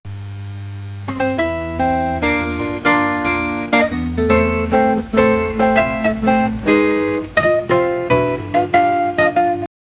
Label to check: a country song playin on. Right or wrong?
Right